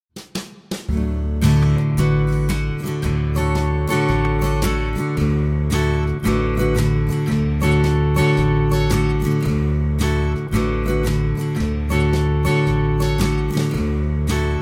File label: with Vocal